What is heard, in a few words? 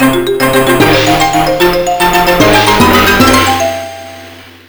Beat Final Boss; American Dragon Jake Long Rise of the Huntsclan; GBA